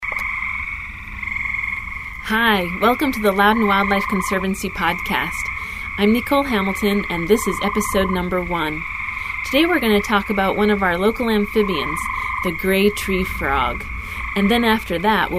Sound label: Gray Tree Frogs